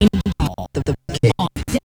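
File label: E E AH 130 bpm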